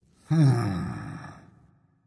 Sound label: thinking, voice; Smite; ymir skin6